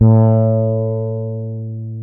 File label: FRTLS A2, FRETLESS; InVision Interactive; Lightware VOL-1; Instruments & Percussions